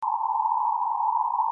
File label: bark